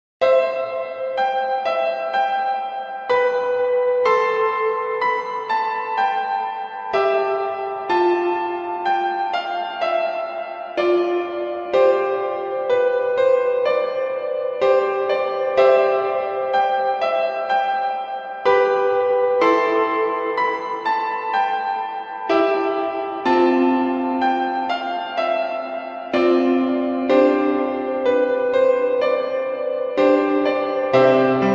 Flute Room (piano version); Yume Nikki

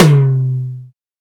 TOM, Jungle; Gorge Sample Pack Vol.1; San Gabriel

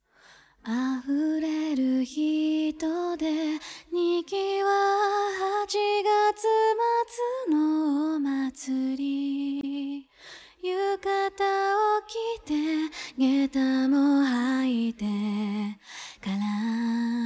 Acapella

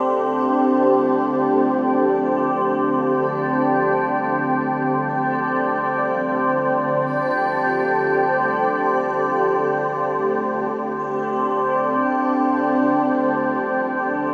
Ambient pad C; Rialasten 2022 Xmas Sample Pack; 2022 Xmas Packs

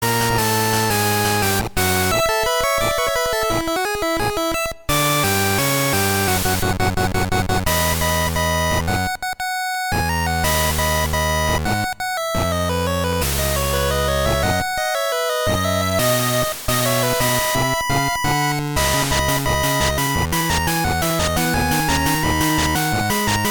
Chiptune

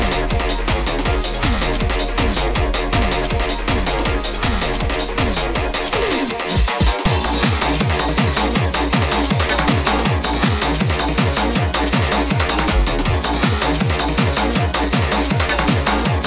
HardBass

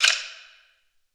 Taiko Stick

